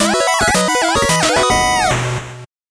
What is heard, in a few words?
8bit jingle fast